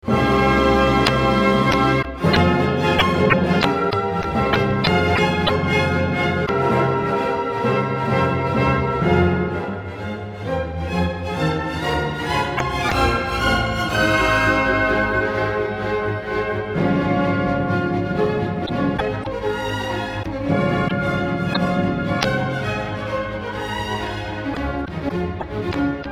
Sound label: clarinet concerto; Weber, concerto pour clarinette en fa mineur, par Sabine Meyer, chez EMI